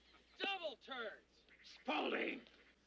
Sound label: Ringtone